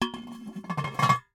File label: Cookie Jar, ceramic, glazed, irregular shape, hollow base, hollow top, rough, resonant, tonal, close; slow; Eiravaein Works; Vaeyan IV